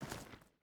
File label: footstep hide stones walk; Rust